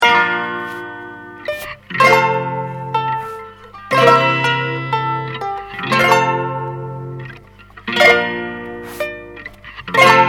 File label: ska polka; zigeuner